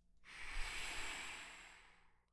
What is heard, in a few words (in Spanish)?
Soplido